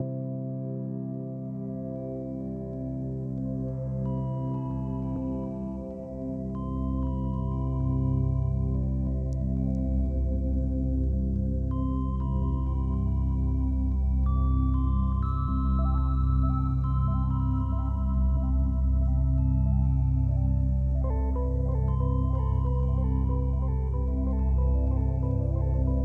menu music; Polygod

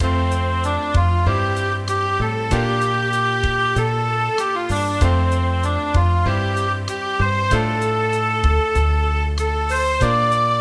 Quiet Melody

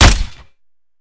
machinegun fire; Shattered Horizon

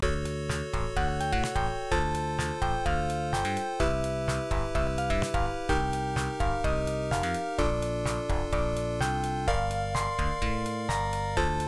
In Game Bgm; Devil's Course; PC-98

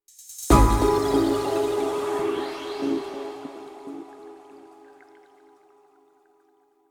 Indian rain negative

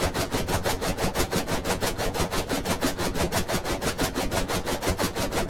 Hover Car Broken

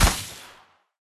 Barrage; Hero Tramin

